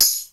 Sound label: TAMB, HR-16; Hollywood Edge; The Big Bad Beatmachine; HR-16B